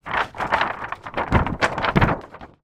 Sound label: large poster movement